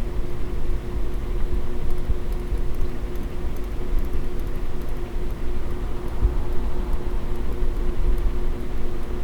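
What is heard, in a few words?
ceiling fan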